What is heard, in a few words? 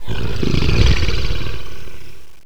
groan; Notrium